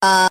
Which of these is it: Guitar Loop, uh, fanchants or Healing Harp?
uh